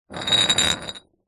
bottles glass beer empty x2 slide on concrete surface